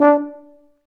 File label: F TUBA, Solo Brass, Tuba stacc